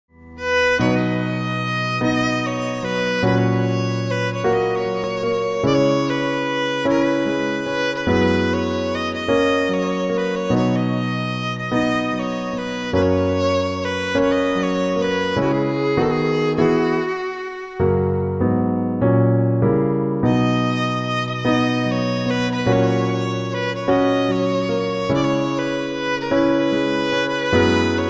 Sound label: Violin Cover instrumen